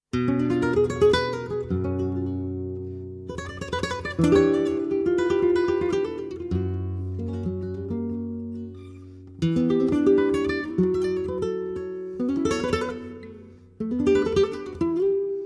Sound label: Solea